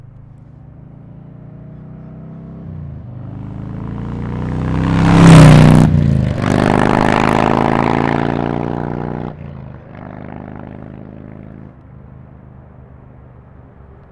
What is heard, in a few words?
Softail 107 Slip-On Fly By, mufflers for harley softail